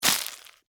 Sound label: Squish Crunch Bug; Horror; Blood and Guts